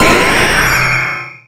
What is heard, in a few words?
pokemon cry